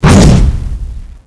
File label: attack; zombie giant